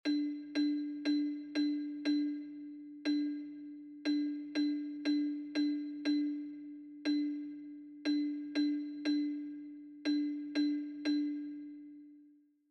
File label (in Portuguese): RE Relogio Regua. Melodia